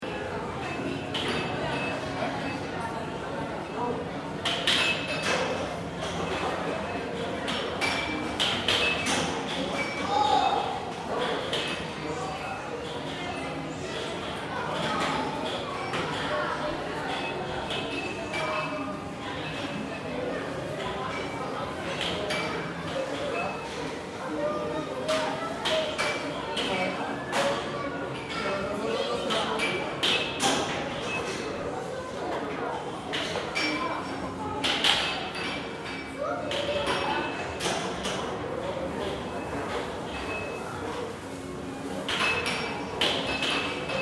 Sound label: Amusement Mall Dense Crowd Loop; Crowd of people in an amusement mall. Various games and activities; Amusement Park Sound Effects